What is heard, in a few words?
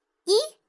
countdown 3